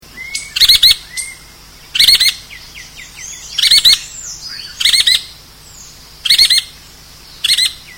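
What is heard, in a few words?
Carpintero Arcoiris, Yellow-fronted Woodpecker, Melanerpes flavifrons; cantos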